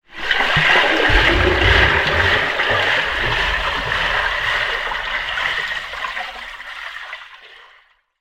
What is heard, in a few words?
sport person swimming away front crawl underwater recording; zapsplat